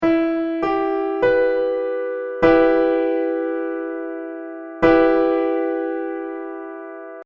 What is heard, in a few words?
minichord Em